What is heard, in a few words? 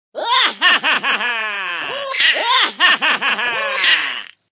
crazy laughter; toque de risadas